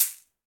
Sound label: Caxixi Open; Latin Percussion; Drums; Drum Hits; Shaker; Ableton; Factory Packs